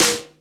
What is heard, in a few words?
perfect acoustic snare sound a sharp key, snare drum sample tuned to the A# not